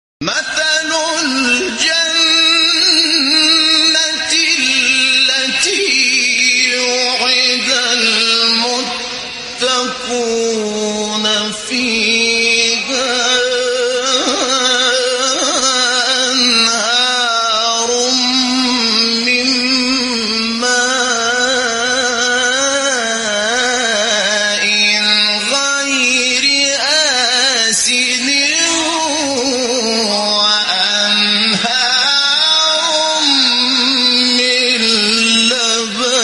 فراز زیبای وصف بهشت در سوره مبارکه محمد; محمود شحات انور

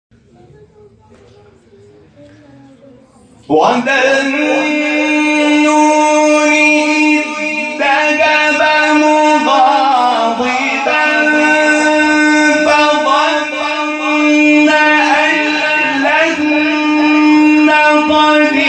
فراز زیبای تلاوت آیه سوره انبیا; 87; حمید شاکرنژاد

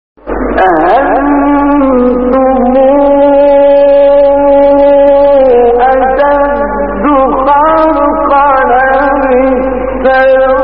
فراز زیبا از سوره نازعات; استاد مصطفی اسماعیل

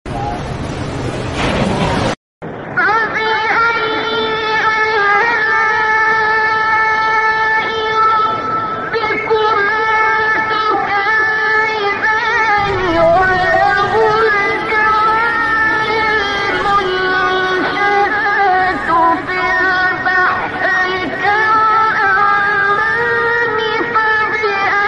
فرازی زیبا از سوره الرحمن; استاد عبدالباسط

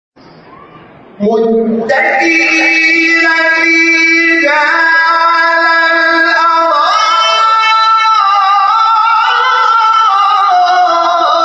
تلاوت زیبای سوره انسان; وصف بهشتیان; حامد شاکرنژاد

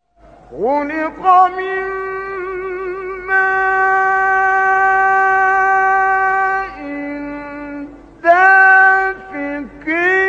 تلاوت زیبا از سوره

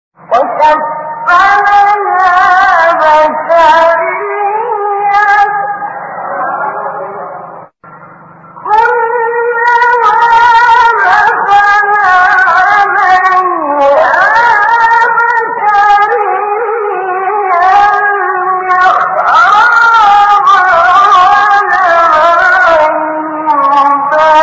فراز زیبای از سوره; آل عمران; استاد شعیشع

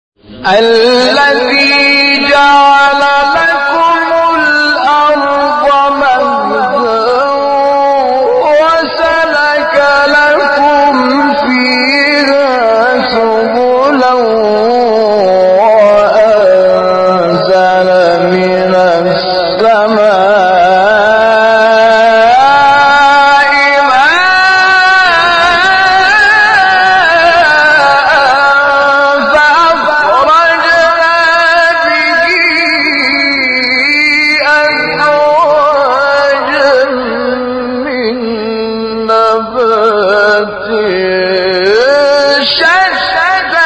تلاوت زیبای آیه سوره; طه; حامد شاکرنژاد

